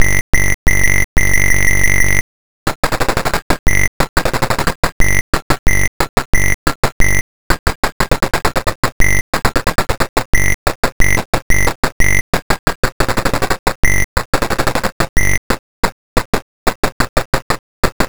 arcade main background music; 1942

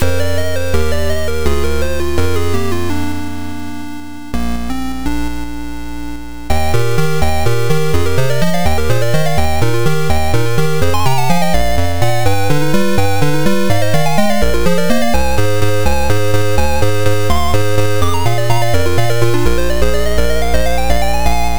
atari hiscore; ghosts n goblins

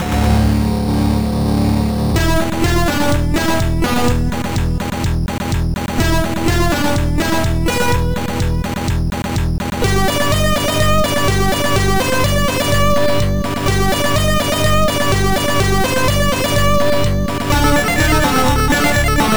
amiga in game; turrican ii

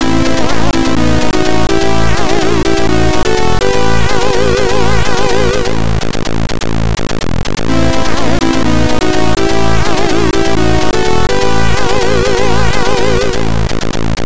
c64 cute tune

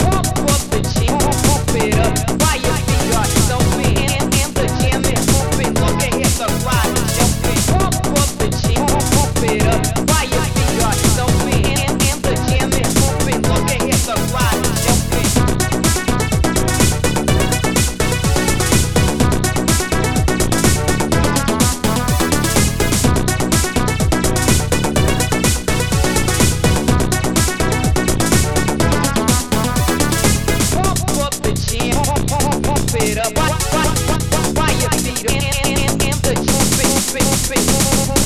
amiga housemix

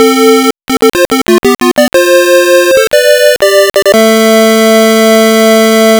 arcade game start; track field

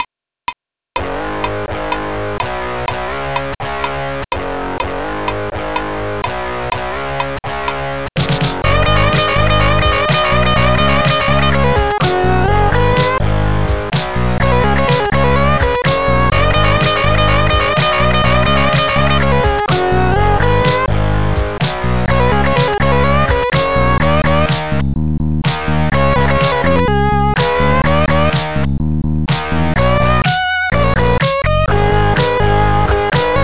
amiga in game; led storm

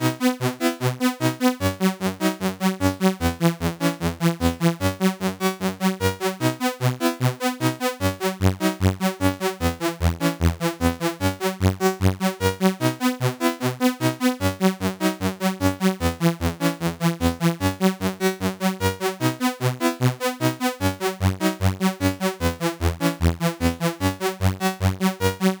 c64 in game; lazy jones

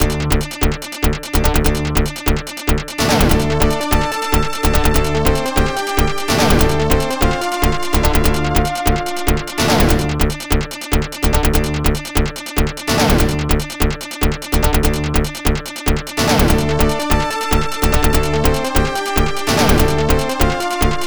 arcade player select; alien storm

